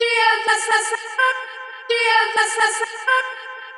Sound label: chopped vocals ping pong house delayed F 127